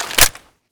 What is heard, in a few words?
m4 mag in